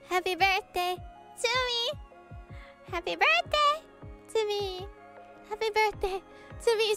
Happy Birthday to me

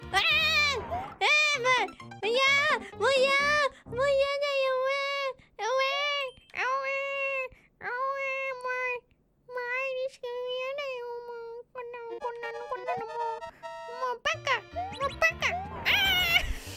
dying noise; noises; Mario

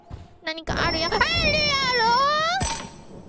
Scream; IRyScream

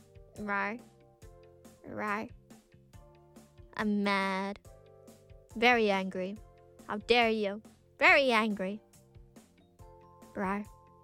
angry rawr

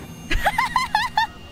laugh

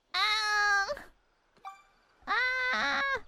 hope noises

